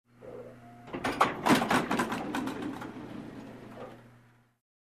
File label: Industrial Metal Clanks